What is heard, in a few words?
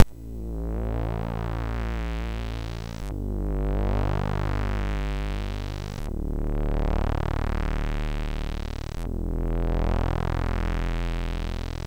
Bells, sid, MOS6581; MOS6581R3